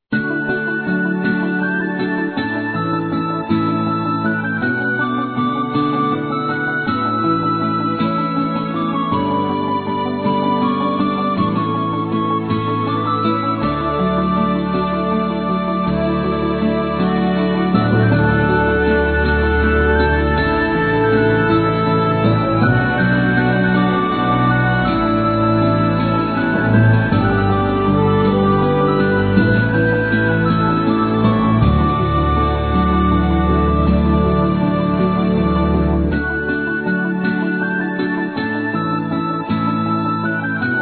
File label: game Sound; habilidade